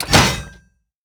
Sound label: bear trap fire; Rust